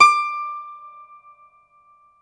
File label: IRISH BAZOUKI, Plucked Strings